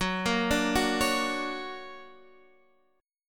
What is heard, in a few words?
D Augmented Major 7th F sharp, guitar